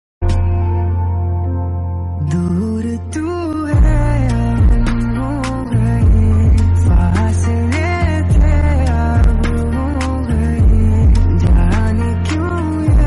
song ringtone

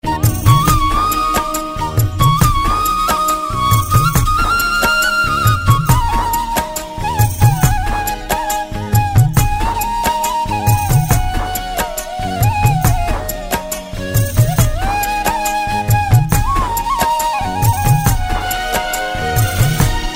MUSICAL BANSURI RINGTONE

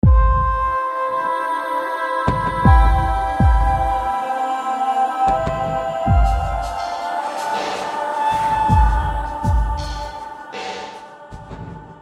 BLACKBERRY BANSURI RINGTONE

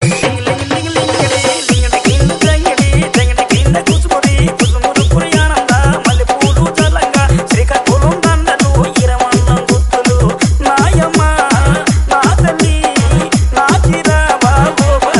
Telugu ringtone

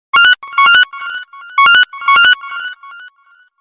business SMS tone ringtone

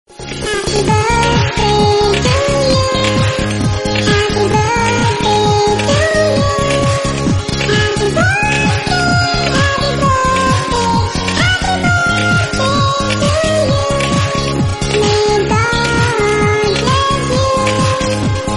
HAPPY BIRTHDAY CARTOON RINGTONE

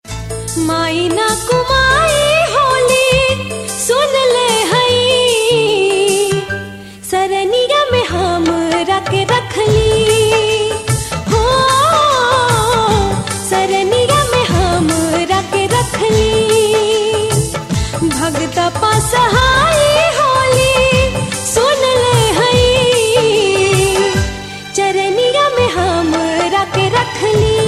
BHOJPURI RINGTONE

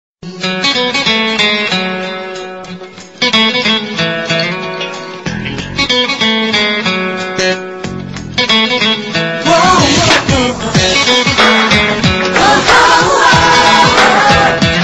INSTRUMENTAL RINGTONE

